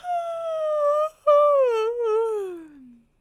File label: Yawn Yawning